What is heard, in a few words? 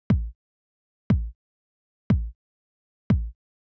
TR909 Kick; hydrogen